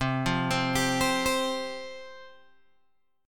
C Suspended 4th C, Guitar Open G

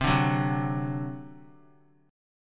C Suspended 2nd Flat 5th C down; Csus2b5 strummed

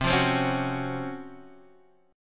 Db Major 11th C

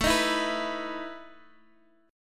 E Major 7th Suspended 4th, A

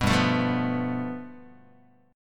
G 9th, Guitar; strummed

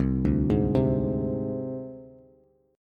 C Suspended 2nd Sharp 5th C Bass; arpeggiated; 8,5,6,5; JGuitar

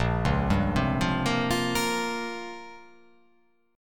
A sharp Minor 6th Add 9th A sharp down, chord

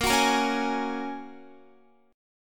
Bb Minor 7th Sharp 5th, Guitar; Bbm7#5 strummed

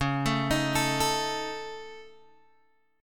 C sharp Suspended 2nd Sharp 5th, Guitar, DADGAD; 11,12,13,14,12,x

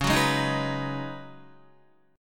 Db 7th Suspended 2nd Suspended 4th, Guitar

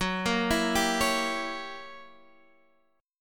Fsharp 7th Flat 9th down Guitar Drop D

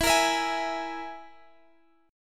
E Major Flat 5th, Guitar, Half Step Down

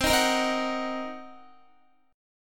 C Minor 7th Flat 5th Guitar, chord; Cm7b5; strummed

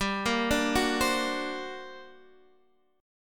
G Minor Major 11th, G

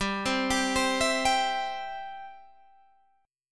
E-Minor Sharp 5th

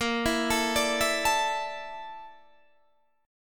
A sharp Major 7th Flat 5th, Guitar Standard; arpeggiated; JGuitar

